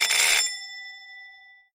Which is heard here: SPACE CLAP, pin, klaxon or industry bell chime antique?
industry bell chime antique